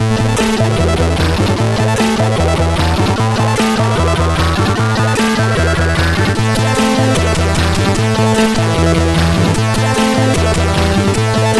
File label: Coins, CSG8580R5